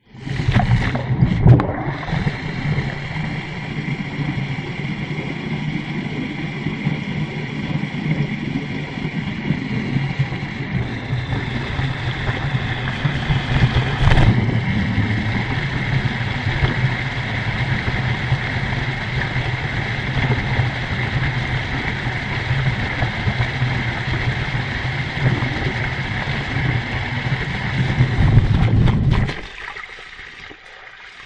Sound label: nature water spray hose into deep water underwater recording hydrophone; zapsplat